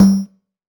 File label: Synth Perc